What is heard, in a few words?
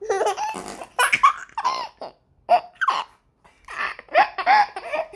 Laughing